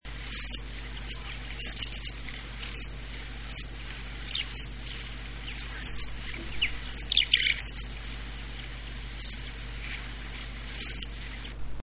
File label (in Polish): jeszcze jeden występ górniczka; skowronki; wroblowe; górniczek